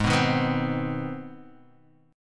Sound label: C Minor Major 7th Sharp 5th G sharp